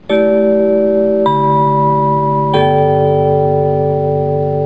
news chime; NBC News Chime

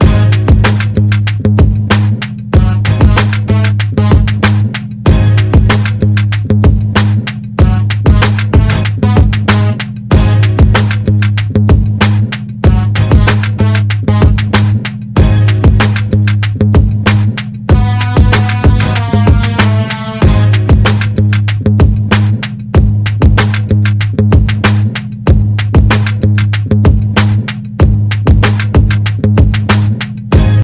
blazing beats

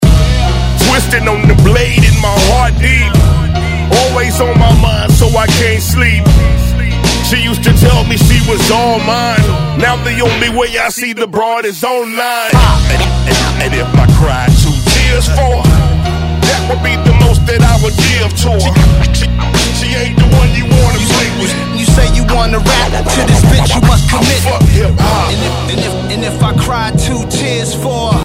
hip hop

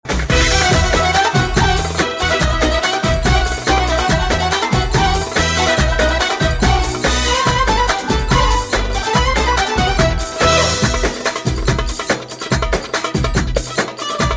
Arabic Ringtone

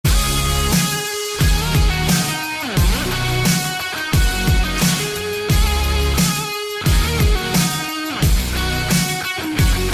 electric guitar solo

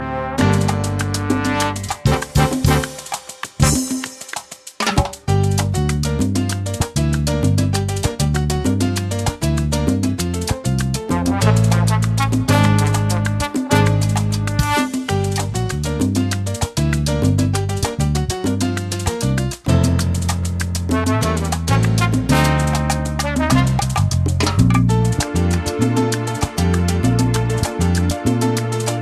salsa

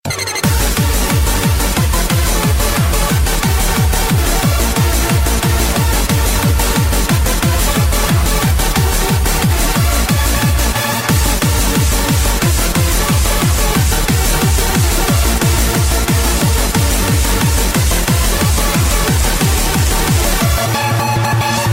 happy hardcore mix

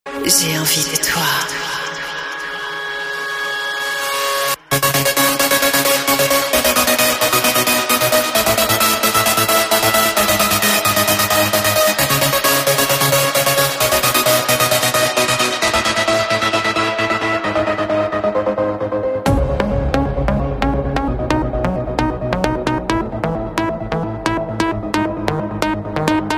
trance vocal

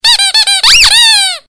alert

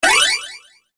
blackberry message

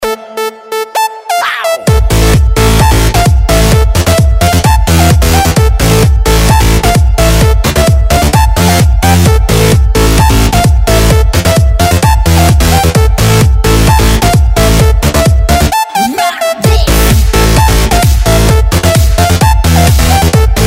electro

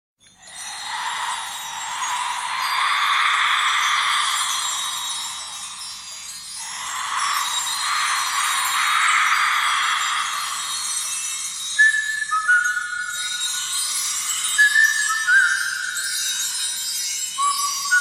whistle bells

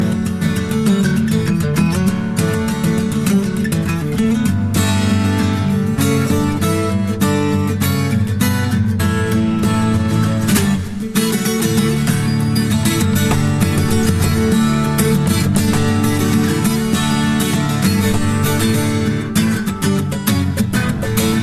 dueling guitars; august rush

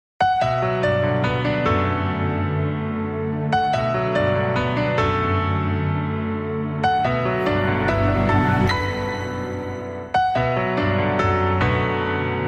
opening piano theme; angel beats

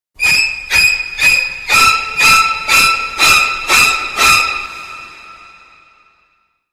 scary halloween

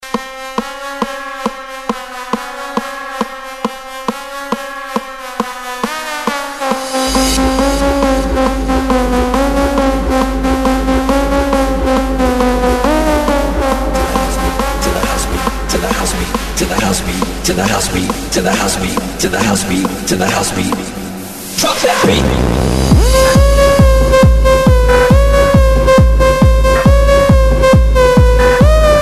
zouk party mix

